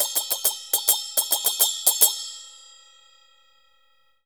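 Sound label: CHINA SM14 L, China cymbal; Spectrasonics - Heart of Asia CD2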